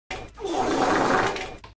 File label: ugandan fart